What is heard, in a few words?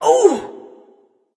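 non verbal ouch, voice; cernunnos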